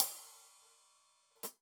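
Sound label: Sab Open Hat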